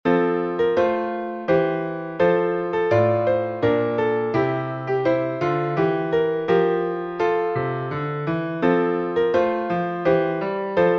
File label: polish national anthem